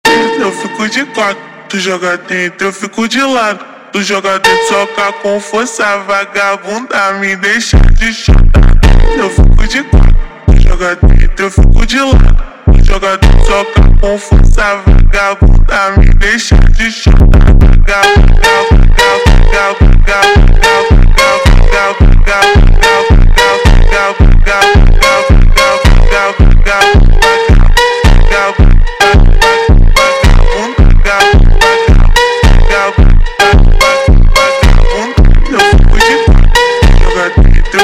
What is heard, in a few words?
Funk super Slowed